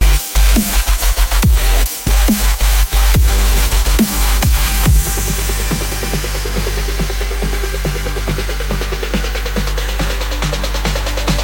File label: electric rhythm